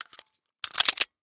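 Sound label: inserting a cartridge into a pistol magazine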